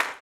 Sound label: CLAP, Blues Soul; Spectrasonics Vocal Planet